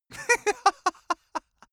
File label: male laugh bright